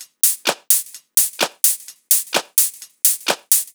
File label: Loop, 128 BPM; Mutekki Media; Vengeance Electroshock Vol.2